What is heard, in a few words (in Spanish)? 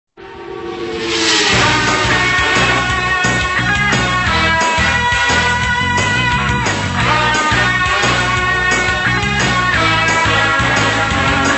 guitar, music, spot; Malboro a lo heavy 93